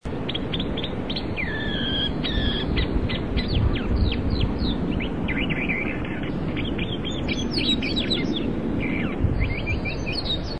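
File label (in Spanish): voz calandria real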